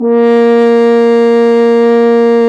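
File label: brass, F.Horn Solo; Roland L-CD702; F.Horn 4 Ln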